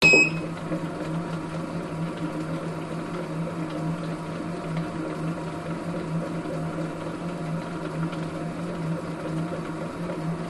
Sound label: sport gym vibration machine switch on beep run off short slow